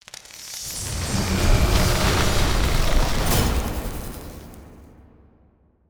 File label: dream change